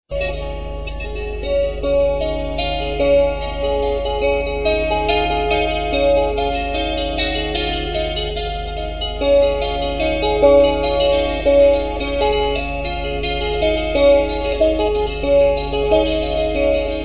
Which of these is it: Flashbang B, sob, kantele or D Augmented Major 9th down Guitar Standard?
kantele